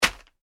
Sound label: packaging plastic drop, Plastic Packaging Hits Floor; Plastic Packet / Packaging Hits Floor; Materials; foley; McKinney Sound